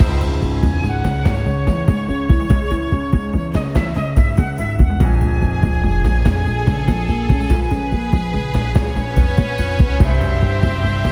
Evil Dungeon, Loop

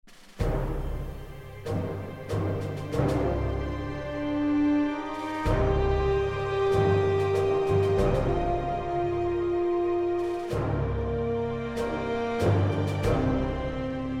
quartermaster music; Dino D-Day

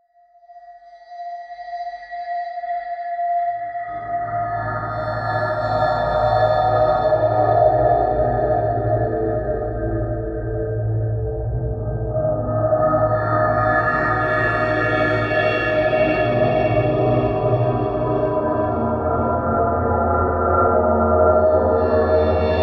Ambience; Black Mesa Terra

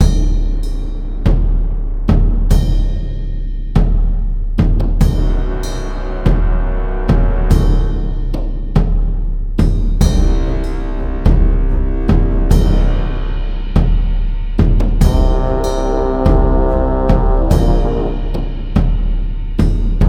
Combat Base Looped

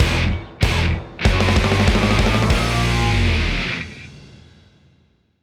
Win Jingle; Event Battle - Bowser Butts In

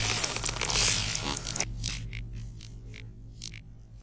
Lane scorpion

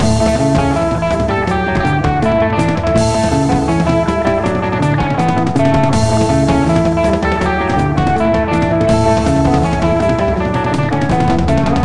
Music Beach; Beach Buggy Blitz; Android

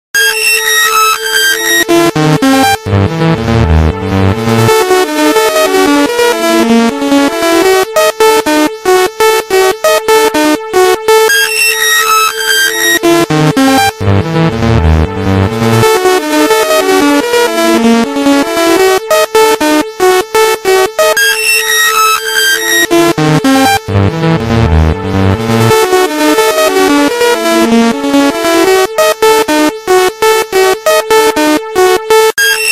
Ghost High Song; timothy and the mysterious forest ps4 switch windows gamerip 2019

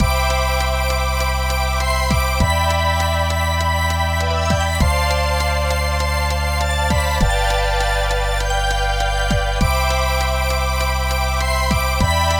BGM; Imagine Master Chef; Imagine Happy Cooking; NDS

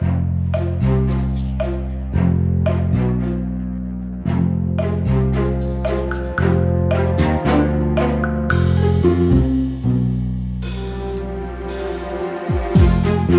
Police

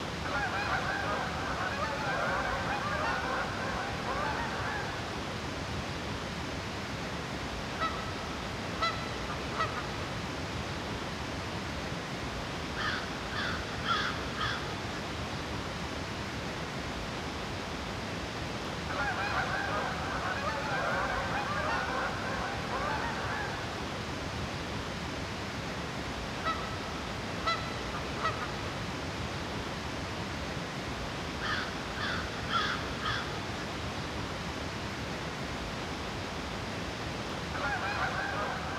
Field Fine Foggy Loud Birds

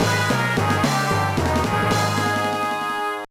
Challenge Failed; TMNT; GBA

